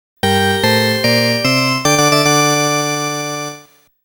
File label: STAGE CLEAR